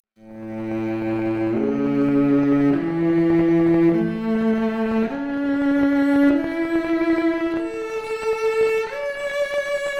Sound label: Violoncello arp, Stereo output noised; 4bit